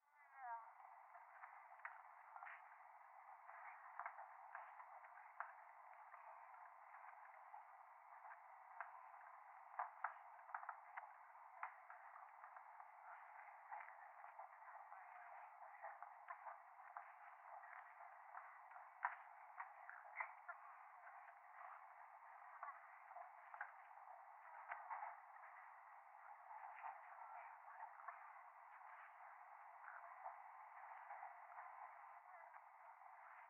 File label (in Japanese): 電話 フェラチオ 電車, ASMR